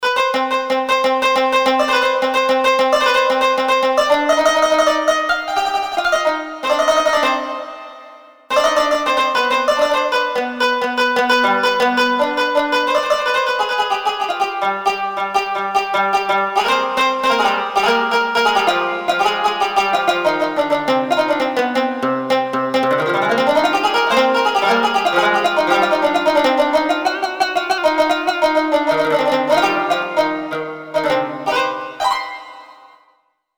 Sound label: SOLO